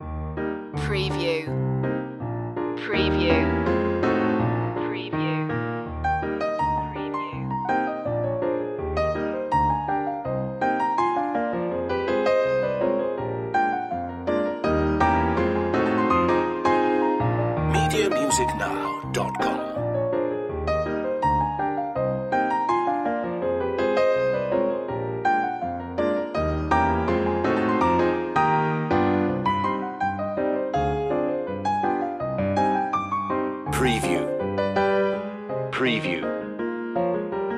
ragtime piano

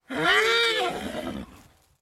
Horse neigh

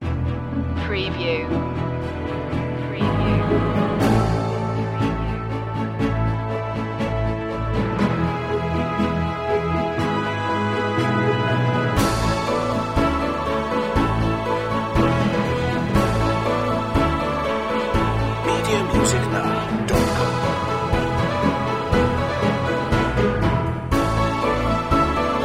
european anthem ode to joy

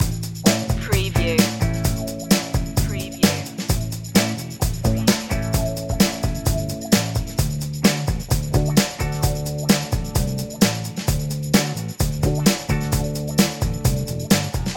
funky rhythm loop

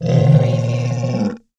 Alien Growl Furry; sound effects bible

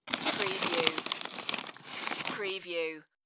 Potato Chips Bag Remove

